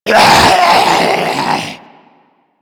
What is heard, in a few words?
Zombie Attack, Vocals; Mariner Zombie